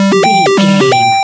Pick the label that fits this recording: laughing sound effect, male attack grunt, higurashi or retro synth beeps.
retro synth beeps